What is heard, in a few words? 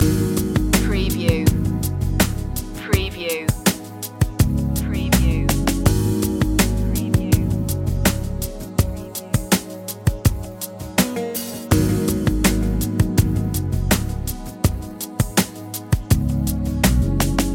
loop, chill